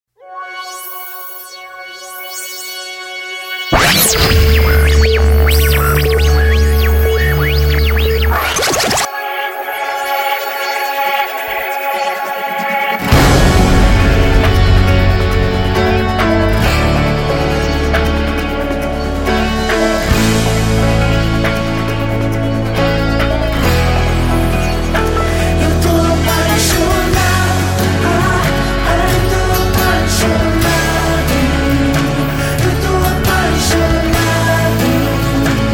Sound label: intro instrumental